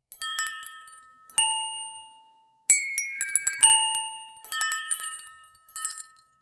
lunchbox opening at school

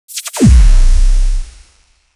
bioenergical heavy plasma, energy weapons

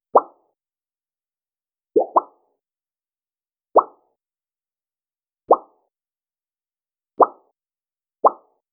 create a soft jelly like, bubble

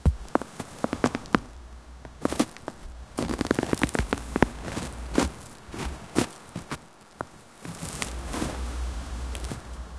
quiet scrape of loose soi

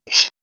kiss sound; human interaction

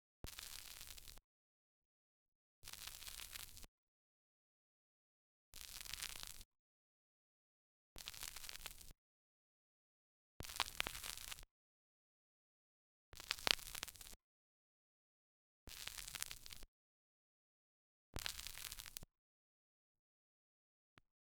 gape hole farts sounds; gape hole farts sounds op

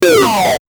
dash sound

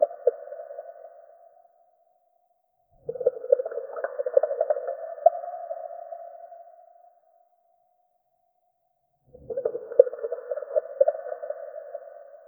cavern background

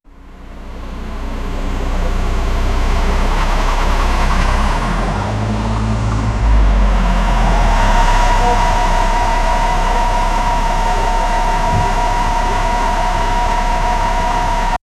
the sound of a bus

